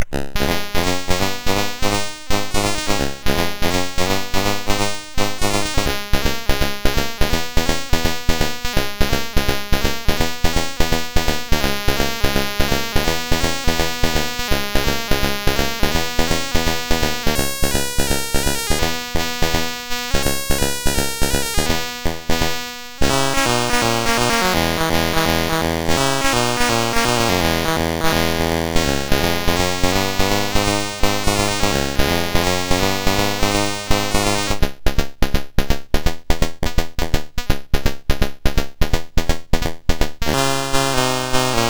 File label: MOS6581R4, sid; Arc of Yesod